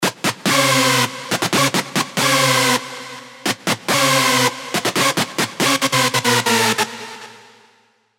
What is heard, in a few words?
screech; Hoover Infectious; Revealed Serum 2 Screech Pathogen